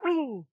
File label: happy; stamps; symbols